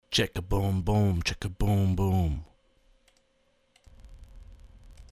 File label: checka boom